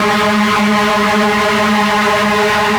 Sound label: Bass; EZRollers; Drum&Bass; Instrument Samples